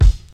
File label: rich lows kick drum single hit f sharp key